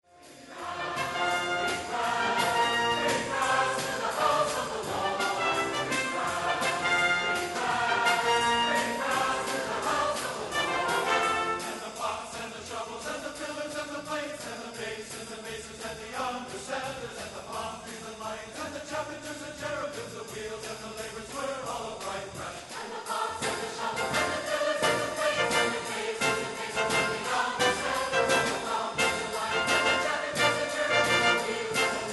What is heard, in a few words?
Brass